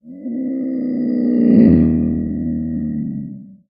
Puma Cougar Growl Sho, big cats cougars

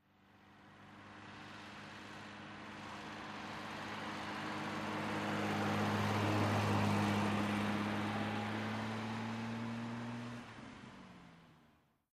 Bmw 530i, Medium Distant Pov, Release Parking B

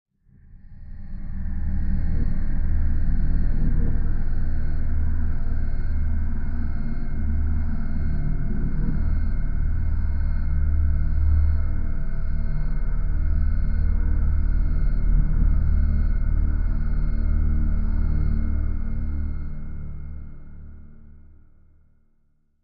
Music Eerie Amb Dron

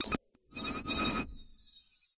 Computer Button Press, stinger

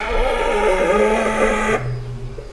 Hyena Inhale Gasp

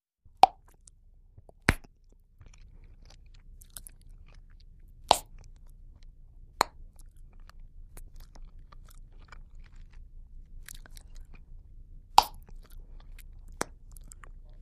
Gum Pop Chew, chewing & eating; Gum Pop Chew Snappi

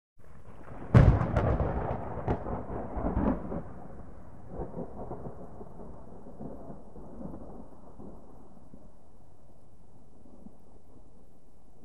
Thunder Medium, distant

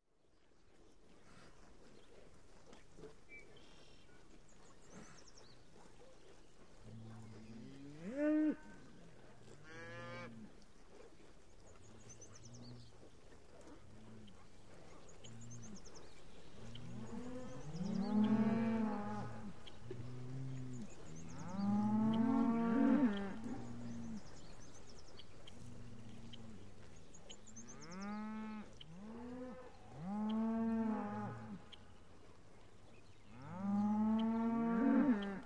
Cow Moos Bird Chirps L

